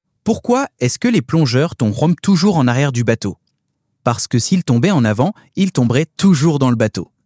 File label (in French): rires en cadence; freepik; contenu ia